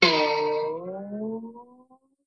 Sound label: vintage twang bowang, Cartoon Sound Effects; Cartoon Sound Effects Kit